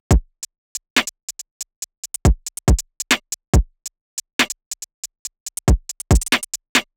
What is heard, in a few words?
snare